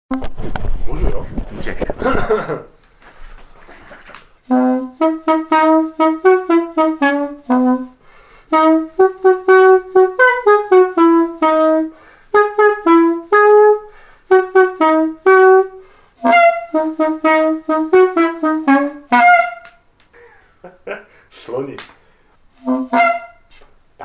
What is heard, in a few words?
Xaphoon